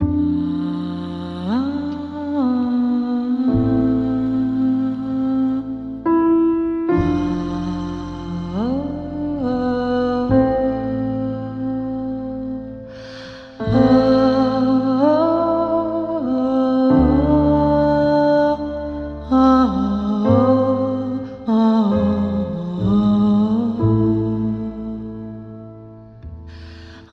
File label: voice somber jazz; Loops; Sad